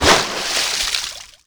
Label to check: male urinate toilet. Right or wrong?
Wrong